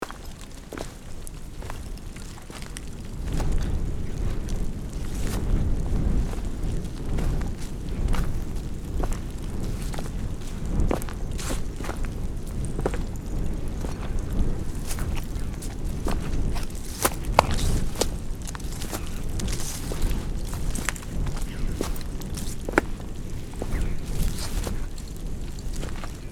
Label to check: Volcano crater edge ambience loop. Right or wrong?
Right